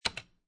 Computer Keyboard Single Key, Office, Computer Keyboard, Single Key Stroke, Hit Space Bar